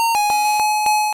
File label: Confusion